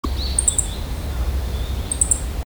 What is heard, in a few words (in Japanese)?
hojiro